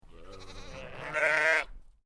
Animal Sheep Lamb And Adult, Adult sheep and sheep lamb, bleating; Sheep Sound Effects; bleating together